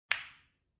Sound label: croquet ball hit